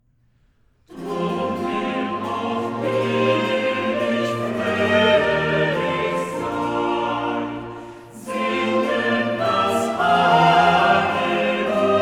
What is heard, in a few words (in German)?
Chorale Drum wir auch billig froh; Ich lebe, mein Herze, zu deinem Ergotzen, BWV 145; Drum wir auch billig frohlich sein